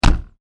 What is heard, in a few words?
Car: Boot Close, Exterior; Cars; Vehicles; Car Sound Effects; Stuart Duffield